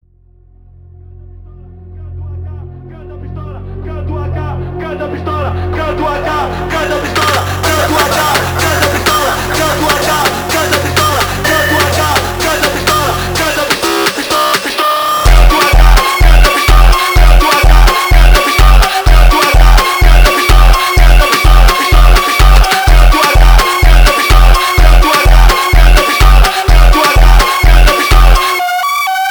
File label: creepy funk